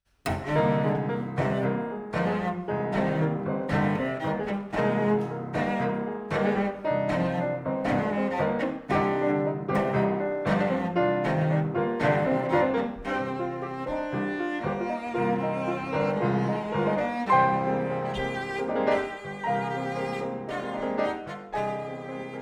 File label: tango